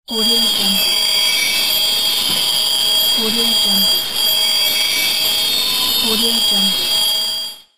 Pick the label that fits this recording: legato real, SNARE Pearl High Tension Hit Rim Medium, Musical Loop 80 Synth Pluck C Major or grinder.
grinder